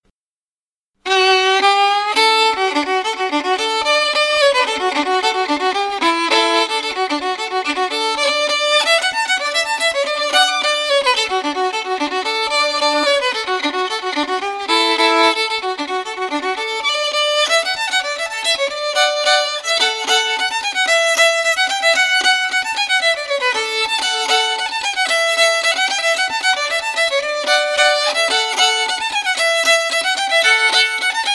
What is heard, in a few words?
fiddle; SeamusGrantFestival; tune tutor